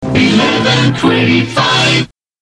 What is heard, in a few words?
jingles